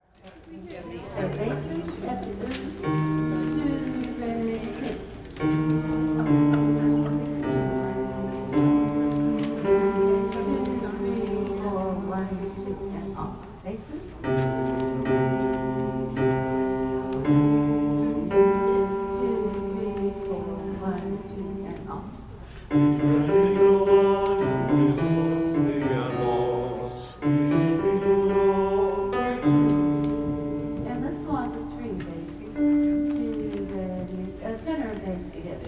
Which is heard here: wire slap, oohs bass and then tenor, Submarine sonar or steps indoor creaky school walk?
oohs bass and then tenor